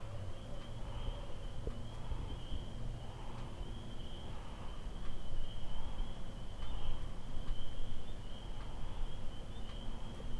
Cranberry Bog, Cat; Chelmsford MA

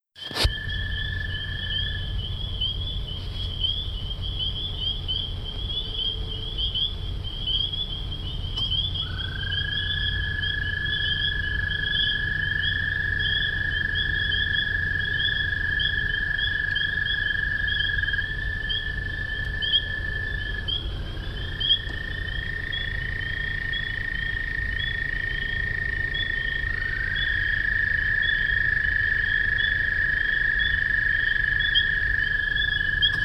spring peepers midnight; Chelmsford MA

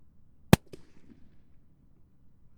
reverb Track; Pomona

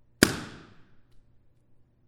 reverb Courtyard

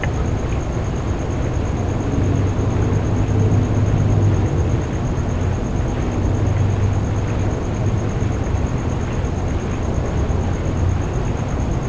Inbetween Hall and Soundscape; Hahn; Carnegie

